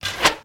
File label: medieval scythian recurve arrow heavy pass by